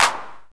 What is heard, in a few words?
909 clap